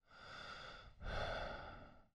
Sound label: man sigh; angband